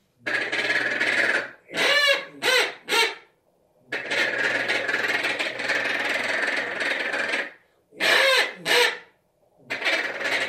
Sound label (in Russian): шилохвость утка